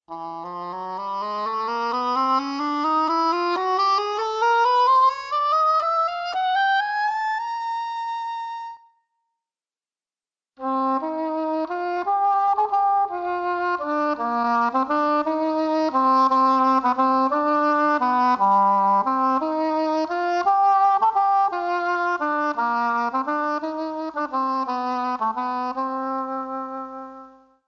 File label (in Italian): corno inglese